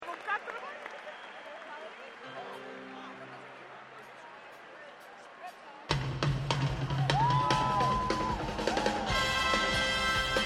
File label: Ring The Bells